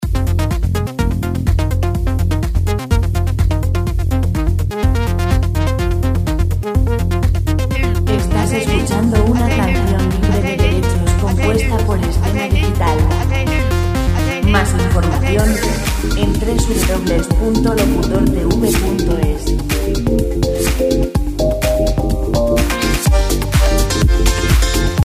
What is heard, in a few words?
125 dance; background free music